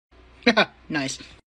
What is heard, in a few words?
haha